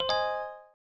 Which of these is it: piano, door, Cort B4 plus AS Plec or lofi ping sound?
lofi ping sound